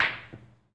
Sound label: Single Hit